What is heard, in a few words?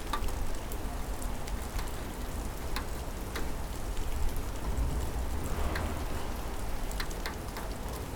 rain window; 99Sounds; Rain And Thunder